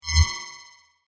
Laser Sword Attack, light saber, science fiction sound effect; Light Saber Sound Effects